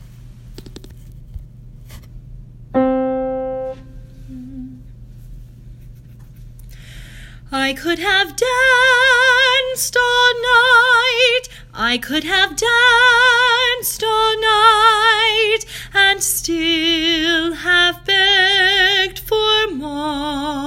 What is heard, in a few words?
Soprano Recording